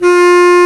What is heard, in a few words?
WND BS CLAR, Bs Clarinet, Orchestral Winds; Roland LCDP04 Orchestral Winds; Lo Clarinets